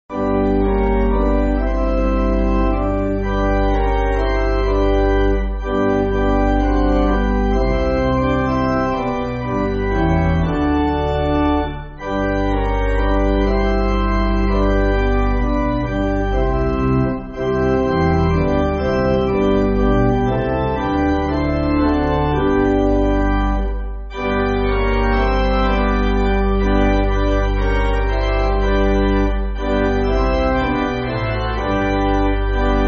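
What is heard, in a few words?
Pipe Organ, church music; O My Father; Andriamasinoro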